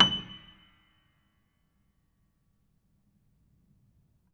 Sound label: Note High, Piano; Piano Miscellaneous